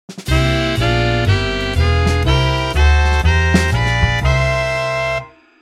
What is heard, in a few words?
Block drop 2 Saxes